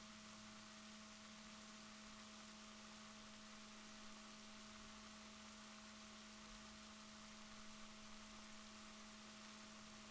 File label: rorquals positif, Balaenoptera Physalus Chile